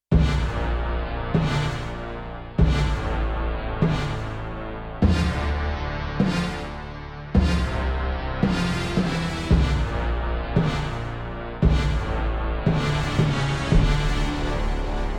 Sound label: Orch Hit, Yamaha TX16W; Orchestral Performance 1